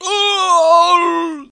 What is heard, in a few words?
Die